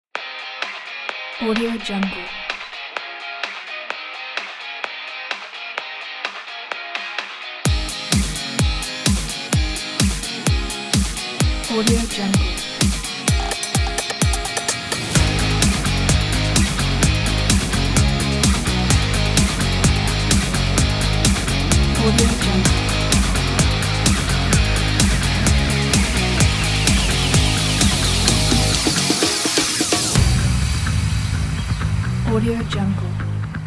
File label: pop rock upbeat sports trailer